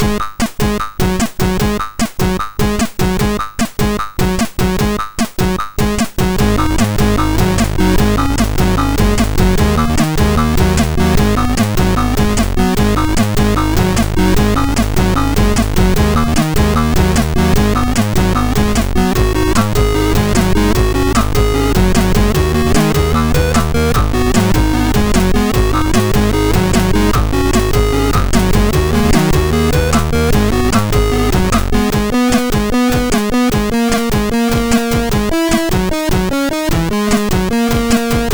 Rock, sid MOS6581R2; TheRyk

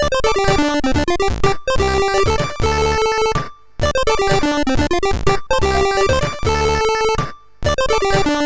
Chip Motif, sid MOS6581R4

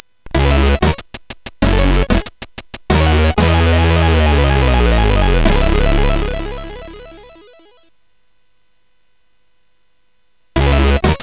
Jingles, CSG8580

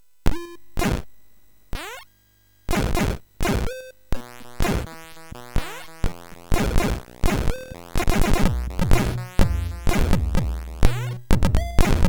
Break-Beep, MOS6581R3

